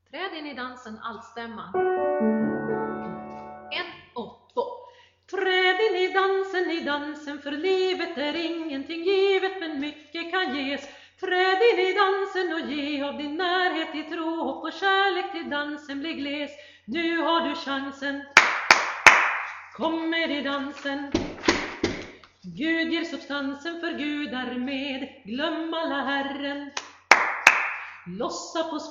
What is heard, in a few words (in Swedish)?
Trad in i dansen alt